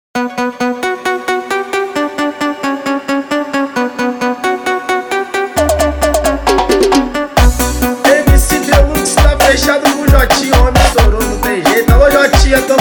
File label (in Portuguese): bregadeira remix